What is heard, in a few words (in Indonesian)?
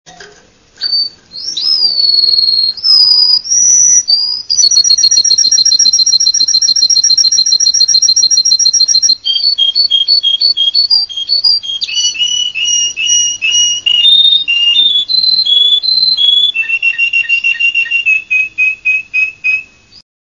kenari rusia